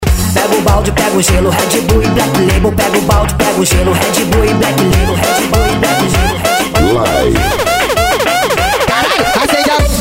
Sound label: melody; studio jnl records